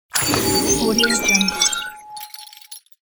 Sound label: sci fi technology; infinity-sfx-productions